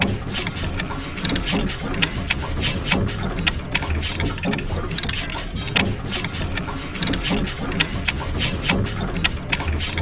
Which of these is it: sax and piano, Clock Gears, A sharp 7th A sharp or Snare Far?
Clock Gears